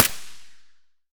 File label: ak74 silencer distant, weapons; STALKER; IWP